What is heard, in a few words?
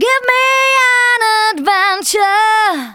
VOCALS SUNG; Techno Trance Essentials